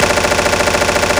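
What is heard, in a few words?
MACHINE GUN; SFX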